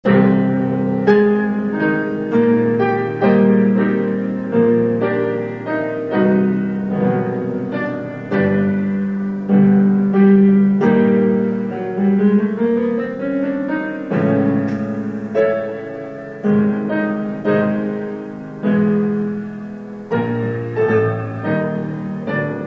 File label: piano sonata; gaskell